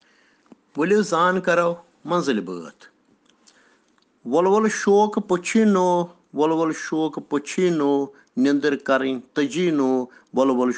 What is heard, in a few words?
Children Rhyms in Kashmiri